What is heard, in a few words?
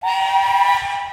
horn train